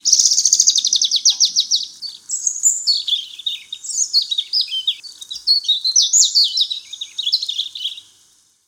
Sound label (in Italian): pettirosso; 1 pettirosso; I 7 CANTI